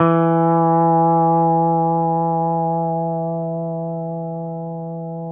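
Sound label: pedal steel E3 left; PEDSTL NOTES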